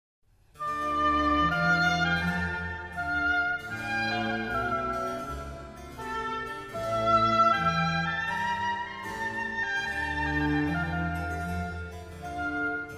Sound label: Concerto for Oboe in D major; RV453; Antonio Vivaldi